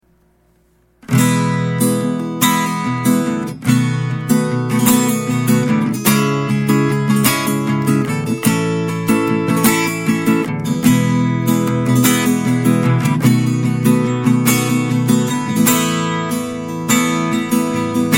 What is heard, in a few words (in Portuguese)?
acustico ao vivo